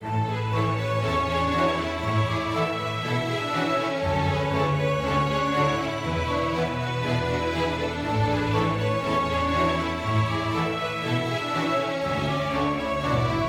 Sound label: happy forte